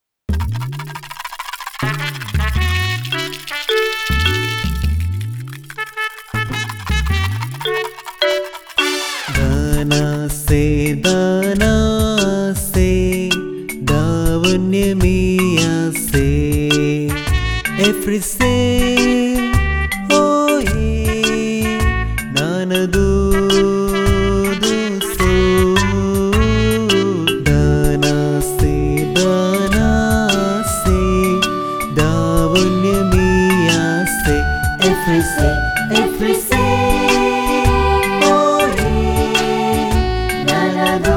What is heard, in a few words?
African Song